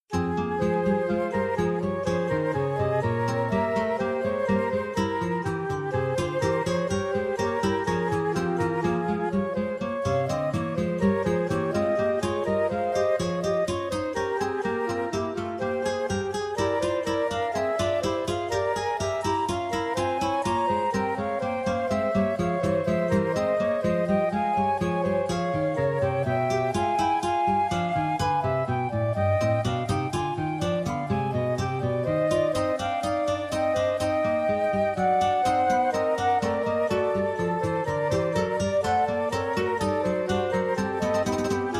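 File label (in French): inventions pour guitares flûtes; bach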